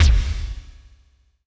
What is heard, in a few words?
Laser Kick, Drums - Kick